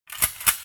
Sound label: shotgun reload mossberg 590